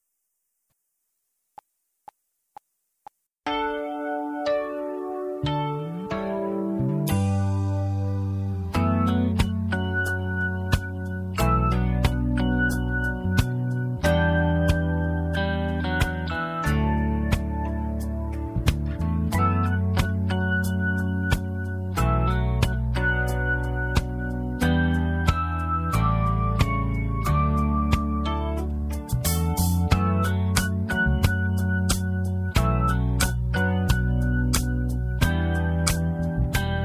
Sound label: Party Song; backing track